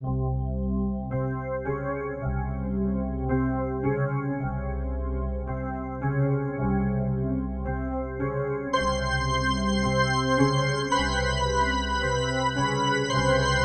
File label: Dungeon